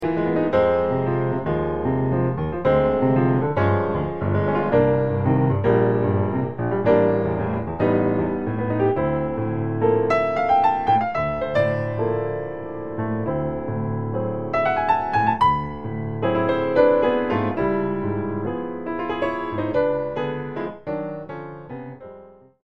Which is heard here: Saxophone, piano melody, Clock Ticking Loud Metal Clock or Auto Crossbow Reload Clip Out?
piano melody